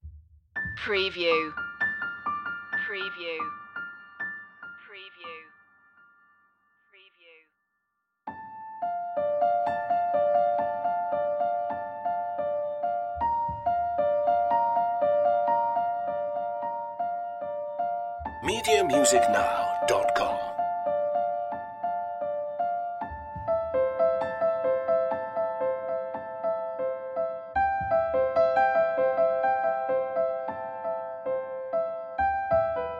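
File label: leaves falling light very high register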